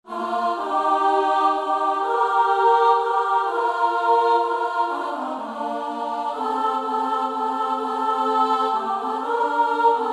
Chorus All

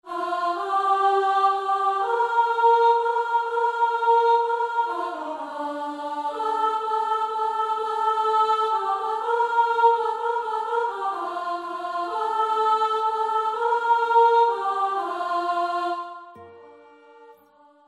Chorus Female

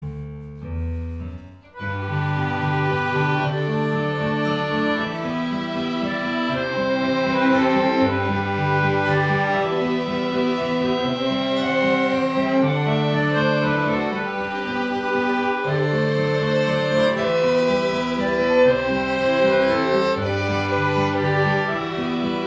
Waltz

